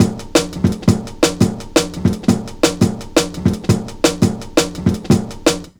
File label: LOOP, Jungle